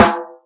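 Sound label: snare, Boss DR-202; Drum Machines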